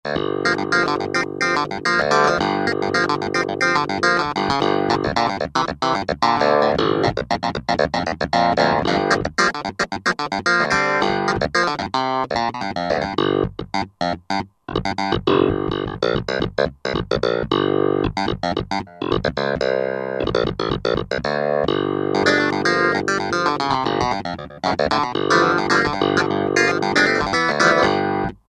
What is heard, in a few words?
Clav 4, Roland SR-JV80-08; '60s & '70s